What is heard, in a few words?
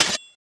shot; pygame